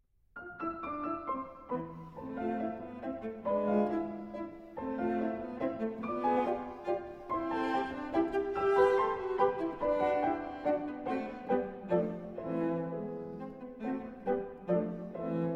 Sonata For Cello and Piano No.1 In E Minor Allegretto quasi minuetto